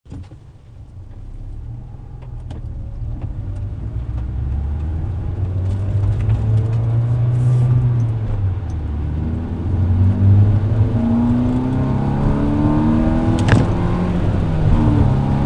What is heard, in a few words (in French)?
peugeot 206 avec kit d'admission direct, enginesounds